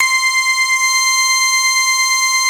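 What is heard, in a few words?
DIGITAL CHASE; Greytsounds; Scott Peers Sound Engineering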